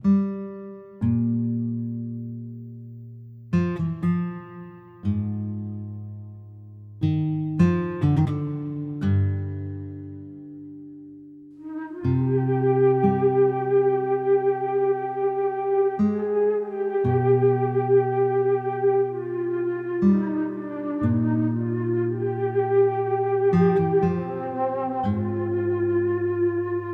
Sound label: spookiertown bgm loop 120bpm Cmin